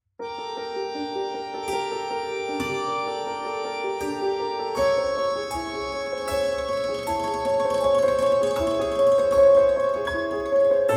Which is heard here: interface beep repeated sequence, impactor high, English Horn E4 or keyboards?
keyboards